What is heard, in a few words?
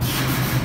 air, Train; OpenBVE; Jerome Yard